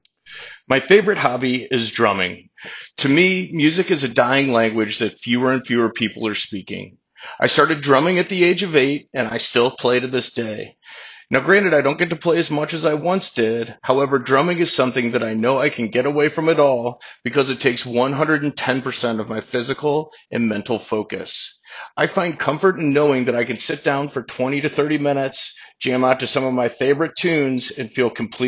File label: Drumming; Hobby